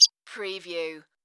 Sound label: Peep